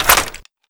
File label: lewis mag out, weapons; sven; ww2projekt